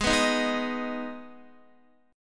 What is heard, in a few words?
Ab Minor chord, Guitar; strummed; x,11,9,8,8,10; 4E,4A,5D,5G,5C,6F